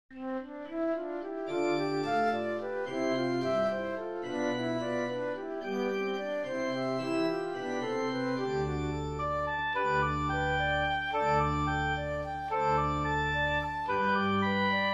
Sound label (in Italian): Preludio e Fuga in C per organo